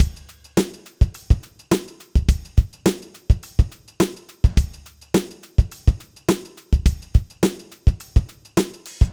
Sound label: Drumloops; MixterPlus